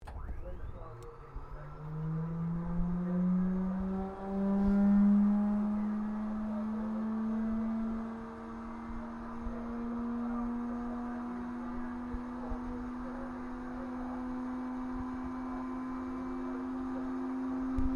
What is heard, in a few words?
AIRCRAFT AT-6D HARVARD MK III STARTUP TAXI INTERIOR; Harvard Training Aricraft; Airplanes; Vehicles